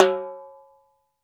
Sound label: H-TIMBA RIM, TIMBALES